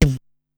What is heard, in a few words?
"Thuum", Single Hits